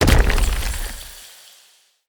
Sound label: Healing Totem Impact; Powers; Fey; Ward